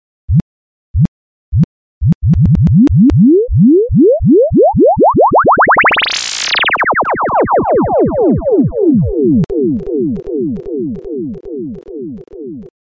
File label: Shifter Time Travel